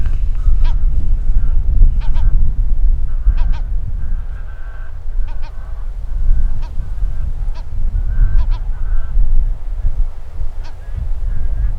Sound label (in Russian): bolsheklyuvaya chayka larus pacificus, zvuki chaek